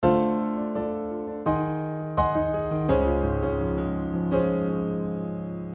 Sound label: augmented sixth mod